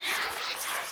Projectile Charge; gamerip; Mage Lord

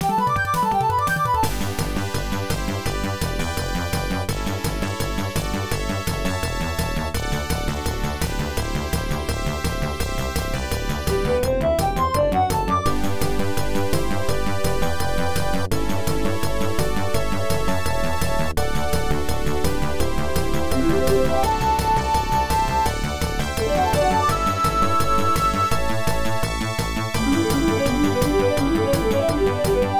HAPPY END; Spider CM - FM

